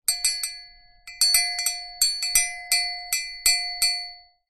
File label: Cowbell